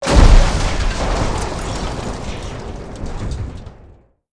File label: CRASH